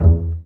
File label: BA PIZZ E1, Orchestra; Best Service; Peter Siedlaczek's Advanced Orchestra